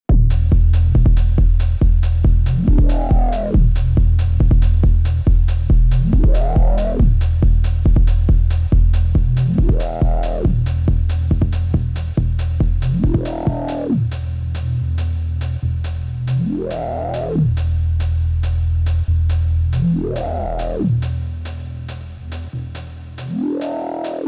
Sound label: techno; Novation Circuit Tracks